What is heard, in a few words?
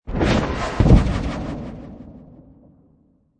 Magical Projectile Whoosh Impact, Magic sound of casting spell